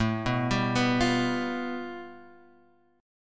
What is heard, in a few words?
A 7th Suspended 2nd Sharp 5th, Guitar, Open G